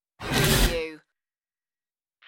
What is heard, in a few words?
space door unlock